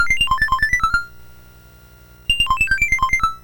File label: BASIC sid MOS6581R4, GAMES; Sword of Fargoal